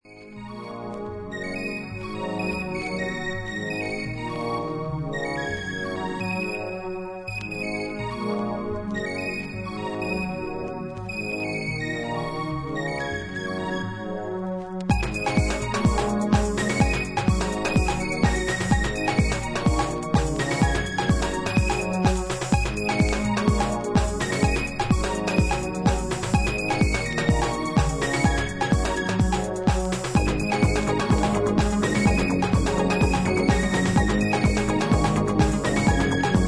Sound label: AMBIENT EXPERIMENTAL